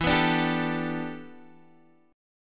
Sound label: E Minor 11th guitar chord, DADGAD